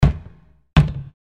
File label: kick